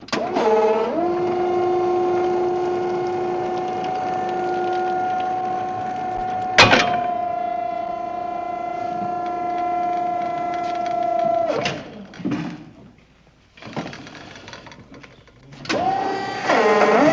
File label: Forklift Blades Moto